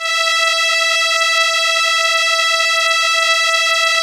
Elka Str E, Elka Strings; Keyboards of The 60's and 70's

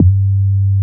MOOG MID G2, ANALOG BASS; Music Store - Claudius Diemeter Schwerlos

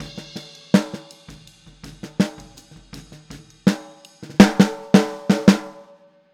Boogaloo - Outro - Snare, 82 BPM; Sonic Foundry; Drums from the Big Room The Mixes

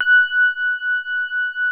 COND SOFT F#5, E-PIANO; Music Store - Claudius Diemeter Schwerlos

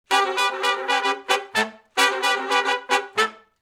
Funk Riff (A), Horncraft; R&B; Sections; Crimson Blue and Fabulous Horncraft 4 RnB

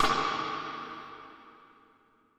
FX kit rim, drums acoustic; USB Soundscan vol.10; AKAI; 90s sample CD

